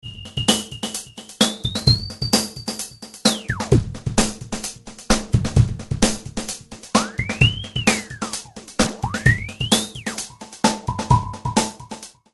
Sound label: Drm loop Wide Frq Sweep